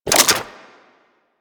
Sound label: Vomit Gun Reload, Weapons, Infested; Warframe